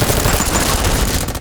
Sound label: sfx skill, ZombieSkill SFX; IdleRPG2024